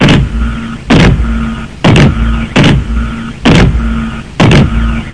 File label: 94 bpm industrial puncher, drum loops and breaks; 091 to 100 bpm